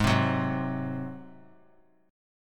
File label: Ab 7th Ab down; Ab7 strummed; JGuitar